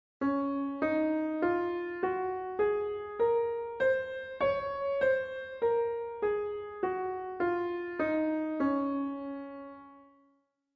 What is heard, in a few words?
Db Scale Piano; California Music Conservatory